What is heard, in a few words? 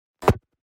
UI invalid sound